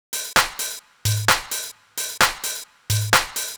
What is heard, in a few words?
Drum Loop 130, Downtown House; Drum Loops 130bpm